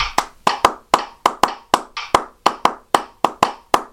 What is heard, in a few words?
reversed clapped